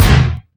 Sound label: airkik; Sonic Foundry (Sony Creative Software); Ugly Remnants VOL-2; Beatstuff